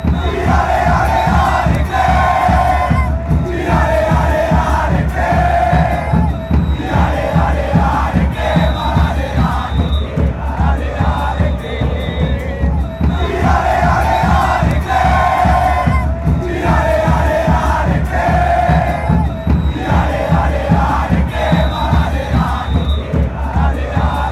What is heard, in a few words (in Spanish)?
chant, argentina fanchants; atletico rafaela